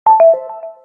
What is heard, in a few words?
bing sms